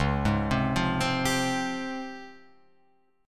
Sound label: C sharp Major Sharp 11th, Guitar Open F; C#M#11; arpeggiated; tuning=Open F; guitar chord